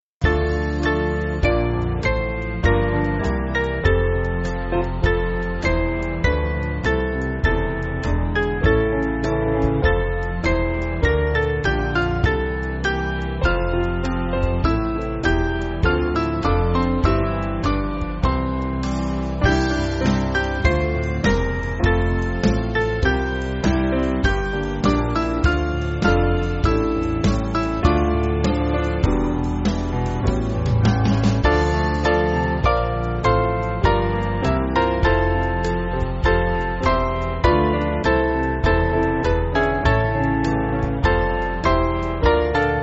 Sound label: Bread Of Heaven, Jesu Jesu Du Mein Hirt, S Band